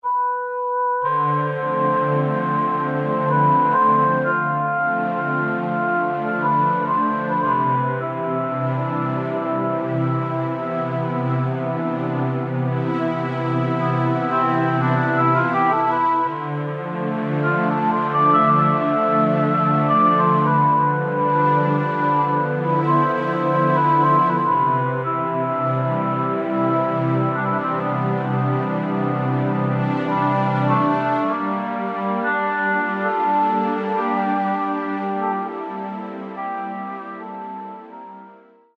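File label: strings oboe, Korg N364; Factory Combi demos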